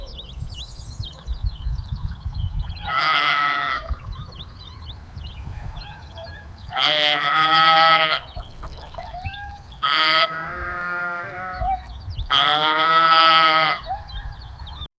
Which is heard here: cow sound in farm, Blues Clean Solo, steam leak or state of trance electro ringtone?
cow sound in farm